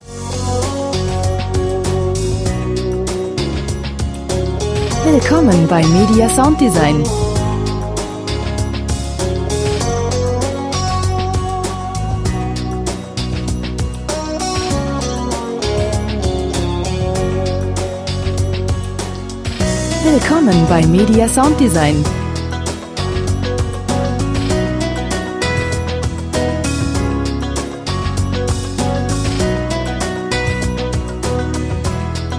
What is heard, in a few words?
soft tender smile